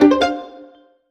Jingle Check; clubhouse games 51 worldwide classics 2020 switch gamerip

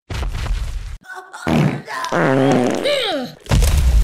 Fart attack; Goddess of Trampling; FaceSit

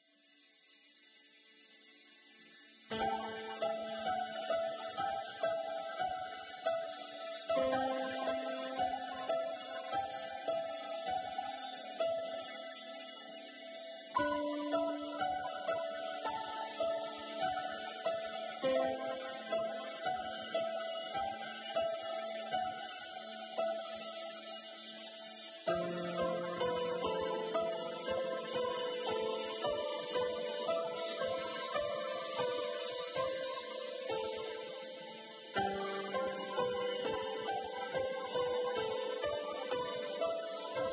Harbour Sting; ether one redux gamerip 2015

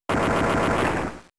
Rock Throw; Pokemon; Gen 3; attack moves; RSE

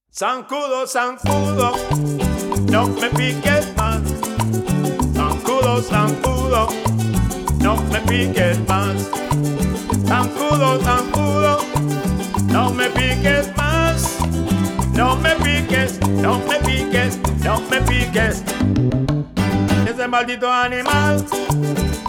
Music Vehicle Radio Events; Jurassic World Evolution

